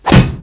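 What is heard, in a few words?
laser set; zaero; weapons; ired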